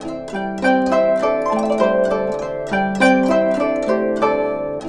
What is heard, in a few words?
GUCHIN 100 C; INIS - Opium